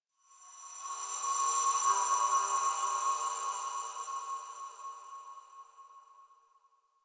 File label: Texture One, Ambience; Warframe; RailJack